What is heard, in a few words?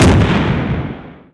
Tank Gun-Medium; 1944 Battle Of The Bulge